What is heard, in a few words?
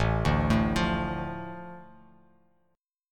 A Suspended 4th Sharp 5th, Bass Standard; Asus4#5 arpeggiated; JGuitar